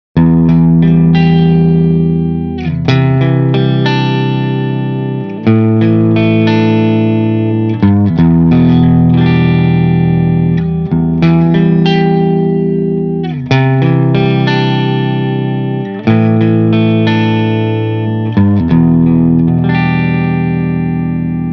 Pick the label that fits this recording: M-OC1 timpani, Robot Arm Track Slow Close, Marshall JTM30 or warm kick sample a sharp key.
Marshall JTM30